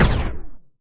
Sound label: explosion laser; Epic Toon FX